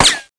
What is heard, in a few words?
metal, srifle; SINDEMO; weapons